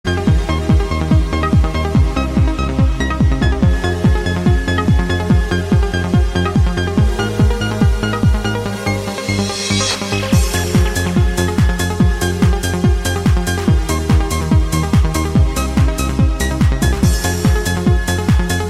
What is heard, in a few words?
Dolphin Trance Club Mix; teckno